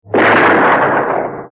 gunshot

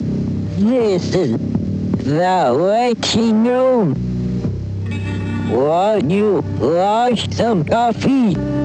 WAITING Room; Twin Peaks

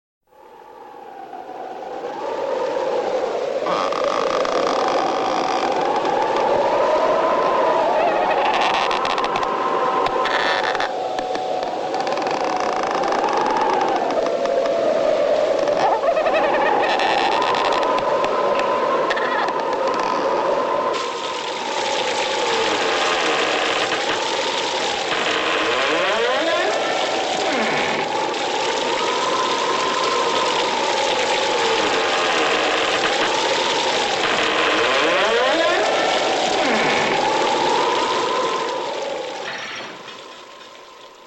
A Collection of Creaks, Halloween, Haunted House

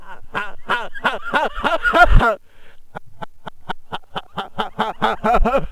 back laff

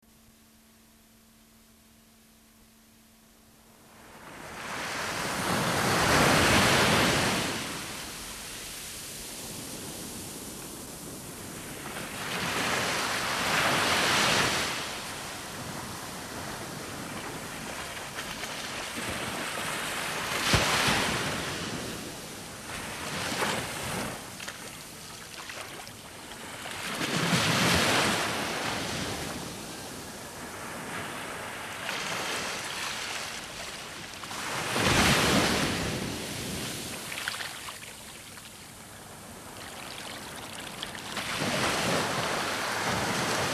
Peaceful Ocean Surf; Atmospheres

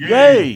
yay

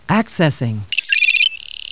accessing beep; Beeps and FX; Star Trek; LCARS Audio

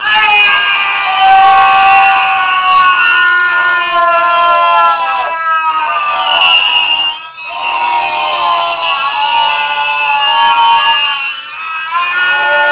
SCREAMS

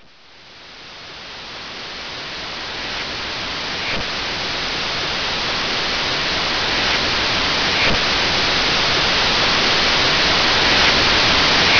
static long

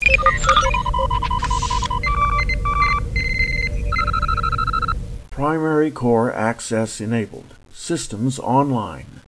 LCARS Start Windows

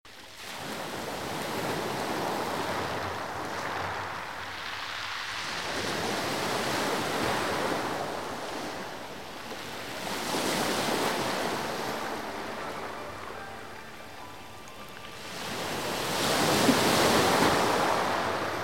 Music for Yoga, Meditation and Relaxation; Ambience; Spiritual

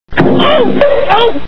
bonk conk; Three Stooges

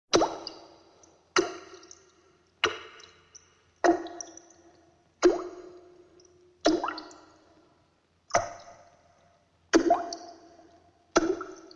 Drips and Splashes; Halloween; Haunted House

